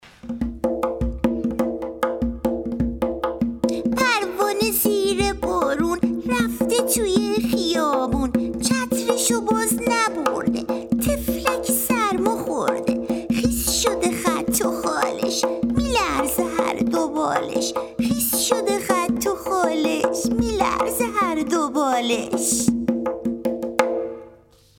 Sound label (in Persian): چتر پروانه